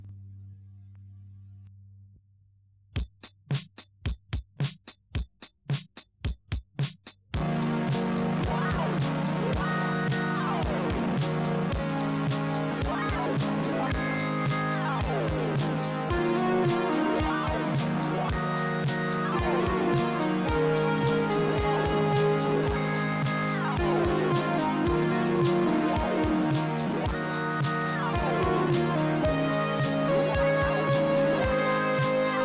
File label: Jupiter8 Synth; Floydy Synth